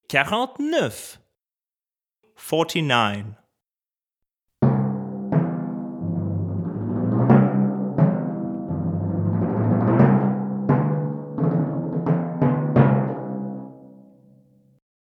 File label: Timpani